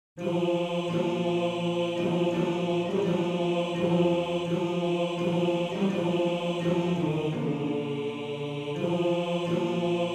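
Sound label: La haut C Bass 1, Männerchor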